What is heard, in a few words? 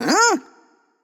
nv confused; cupid